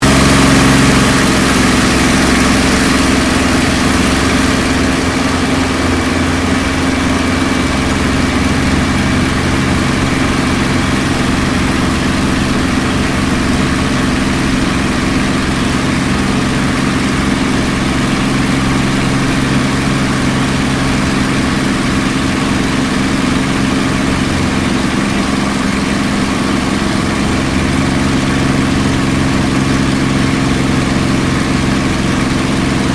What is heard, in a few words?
AIRCRAFT CAC CA-18 MUSTANG MK23 APPROACH LANDING INTERIOR; Aircraft Collection